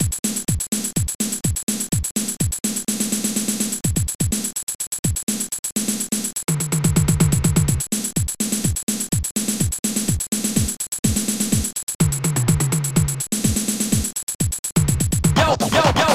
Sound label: rythm